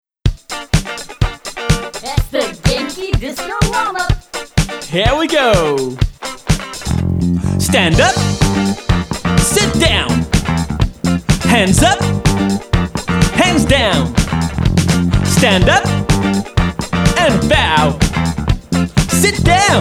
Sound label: Disco Warm Up Song